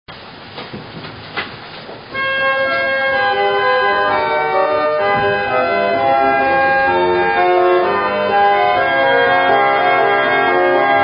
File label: organ